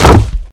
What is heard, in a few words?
shelter hard land; Original TK Sounds